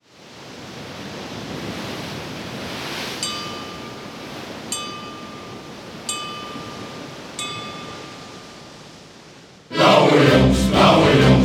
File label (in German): Shanty Chor